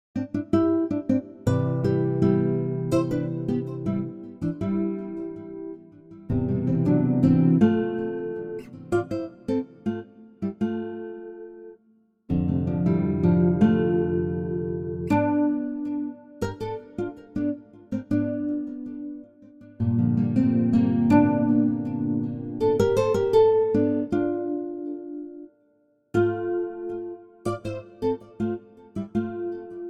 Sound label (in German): Guitaren Mantra